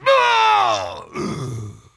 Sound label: Wound Critical; Putress